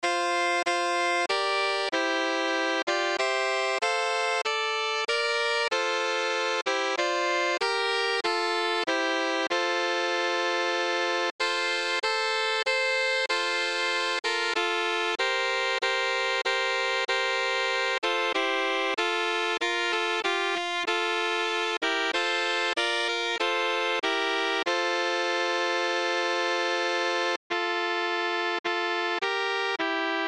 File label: Sax Trio